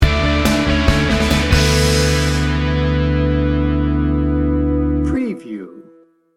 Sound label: indie rock party sting bumper